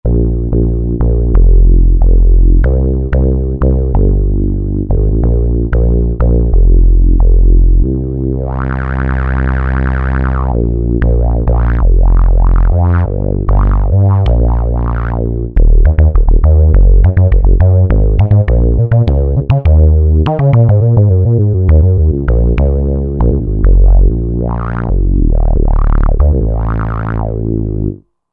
Super JX Bs, Roland JV-2080

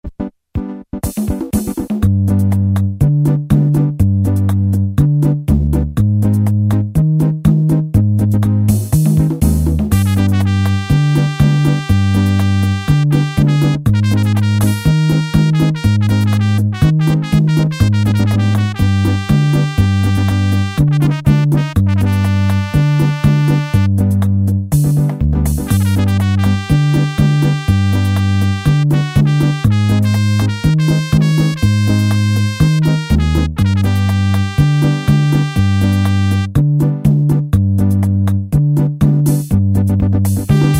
rhumba, Automatic Rhythms

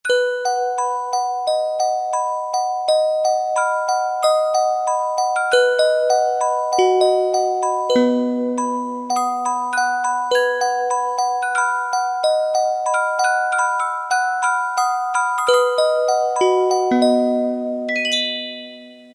Celesta, Roland MT-32

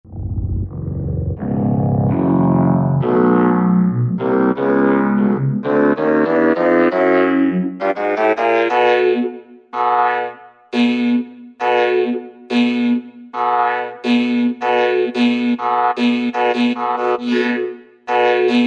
Vocoder Menu; Roland SR-JV80-11 Techno Collection

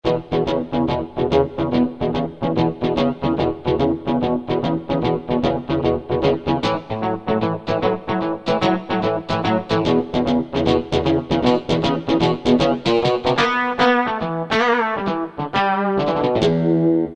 R&R Guitar, Korg N364; Factory Prog demos

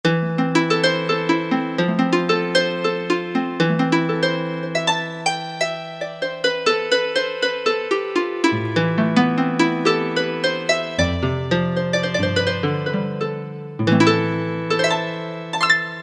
Class Harp; Sanctuary

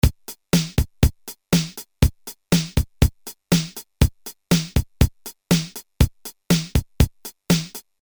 8 Beat, Boss DR-220A Preset Patterns

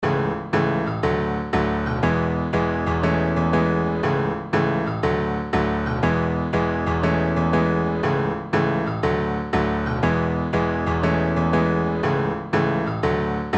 Pop Piano; Apple GarageBand 2; Apple Loops

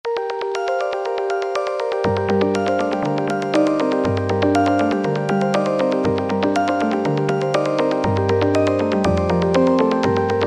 syn Pliedes; E-mu PK-6

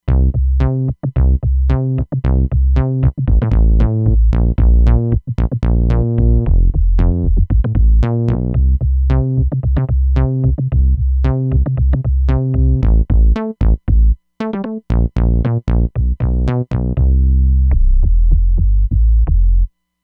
Roland Fantom XR; Nu Bace

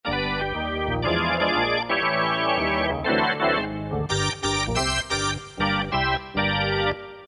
Velocity Rotor, Roland PN-D50-03

